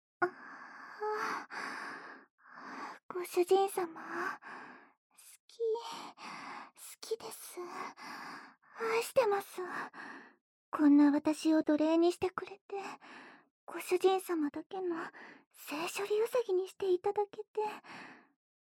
奴隷の高速ぐっちゅぐちゅ耳舐めご奉仕; 通常耳舐め

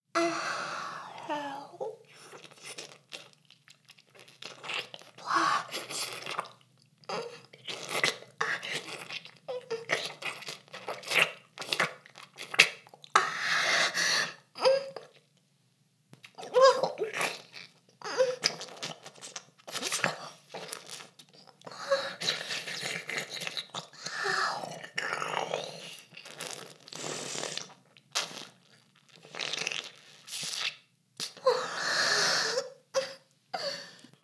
フェラ音声; ダメなメイド

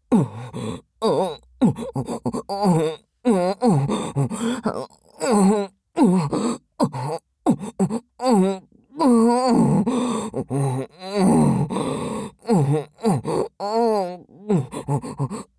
右 喘ぎ; アナル舐め媚薬注入手コキ罵倒オプション

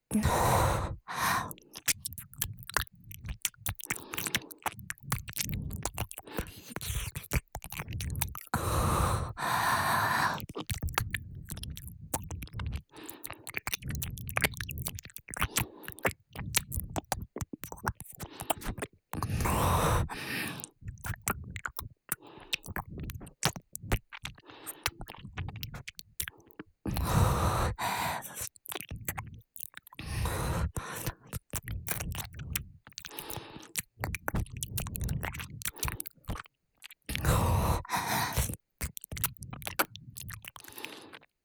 耳舐め（右）舔耳

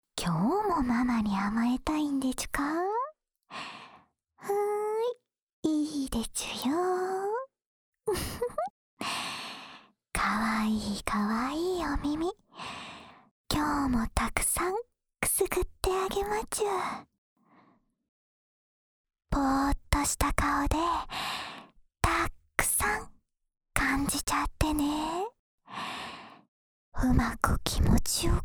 安眠用お耳ふーふーループ（右耳, ASMR

